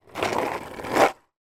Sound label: sport skateboard skate short then kick up wheel spins